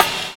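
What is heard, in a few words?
LIVE SAMBA; Univers Sons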